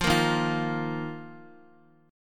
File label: Eb Suspended 2nd Guitar, chord; Ebsus2 strummed; JGuitar